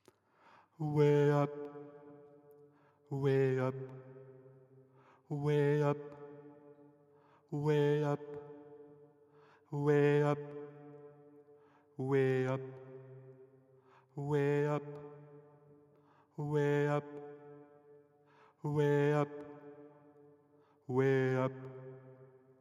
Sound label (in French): ALTO; le CD pour le Téléthon 2013